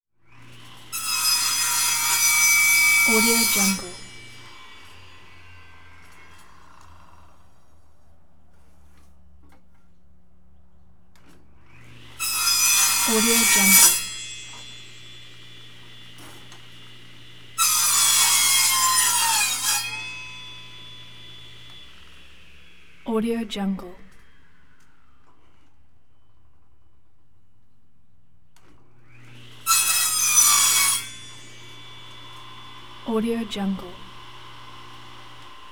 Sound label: electric saw; اره آهن بر; urban sounds